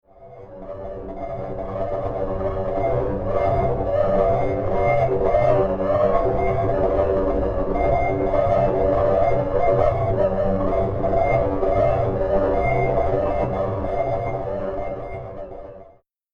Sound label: religious music